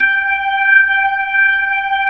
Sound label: B-3, Jazz